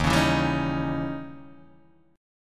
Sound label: D sharp 7th Flat 9th, D sharp, down, Guitar, Open D